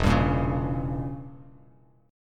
E Diminished 7th E, Bass Standard; Edim7 strummed; JGuitar

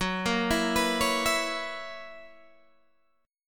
F sharp 11th F sharp Guitar Open G

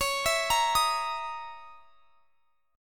Db Minor 6th Add 9th Db down; Dbm6add9 arpeggiated; JGuitar

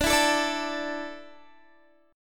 D Suspended 2nd D down Guitar; Dsus2; strummed

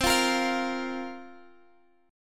A sharp Suspended 2nd C

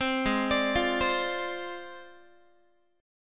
G sharp 6th Flat 5th G sharp Guitar; G#6b5; arpeggiated; 15,16,17,15,16,x

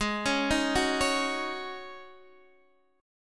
G sharp 7th Suspended 4th G sharp, Guitar Open D

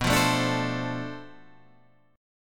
B Minor 7th Flat 9th Flat 5th B, guitar chord

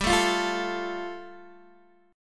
G 7th Suspended 2nd, Guitar DADGAD

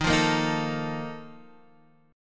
Bb Major 7th Suspended 4th, Eb, chord

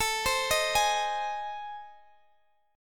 A Minor Major 7th Flat 5th, Guitar DADGAD

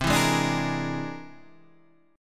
C Augmented 9th; C+9 Chord {8 7 6 7 9 6} strummed

